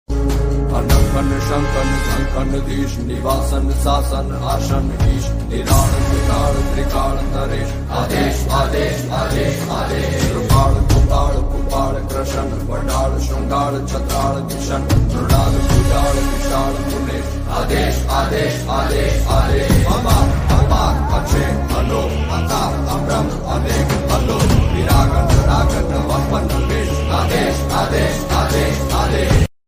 gujarati ringtone

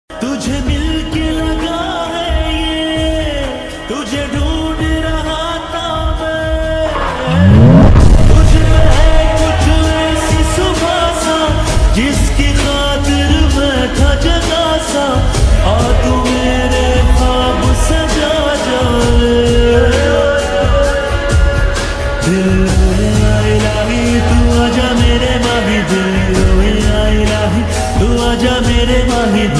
lofi remix hindi ringtone

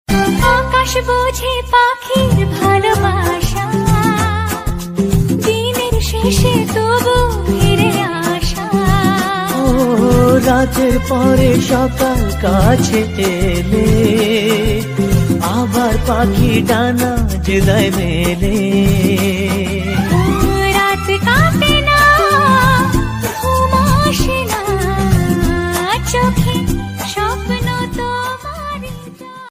bengali ringtone

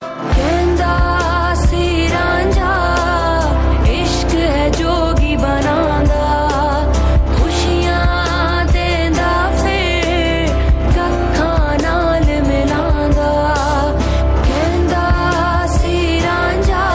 OST pakistani ringtone

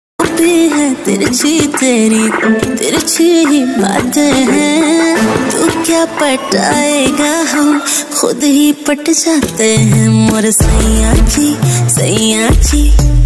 hindi rap ringtone

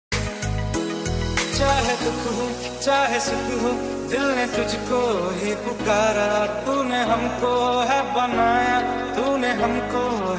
hindi mashup remix, hindi ringtone

